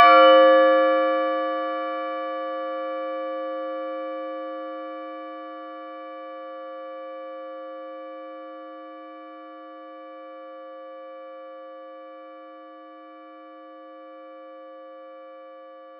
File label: synth; sound effects and sound reinforcement